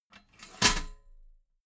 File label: metal ladder small handle fold regular